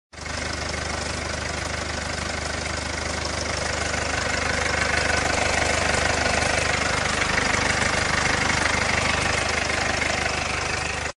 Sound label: glc coupe 250d engine sound; glc-class x254